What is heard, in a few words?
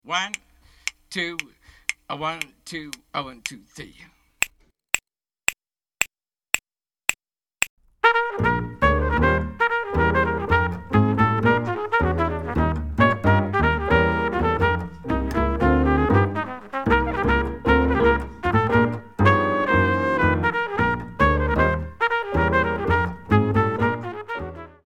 minus Drums snaps; Medium; count off sets up the intro